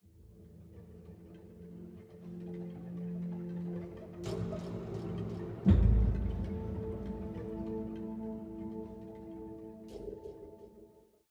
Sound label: Military High 85bpm